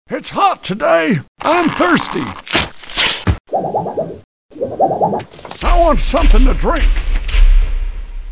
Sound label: walk tree; T.J. Finds a Friend